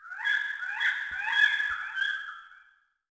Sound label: canyon eagles; ambient; World of Tanks Blitz